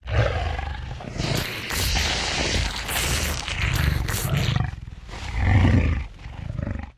eat, creature; stalker

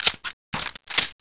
pistol reload; weapons

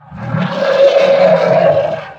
sucker growl, creature; stalker

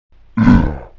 pain

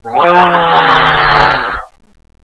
alert

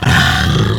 vampire hit; stalker

